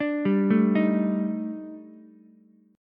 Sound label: Eb Major 7th Flat 5th, Ukulele; EbM7b5; arpeggiated; JGuitar